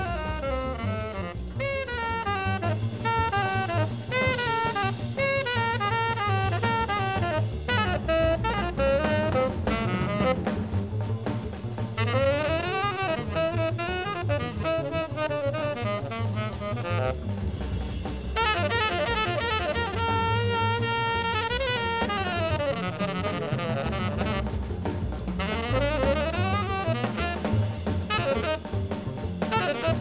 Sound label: jazz